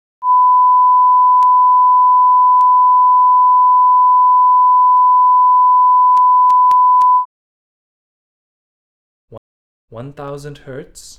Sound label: Test Signal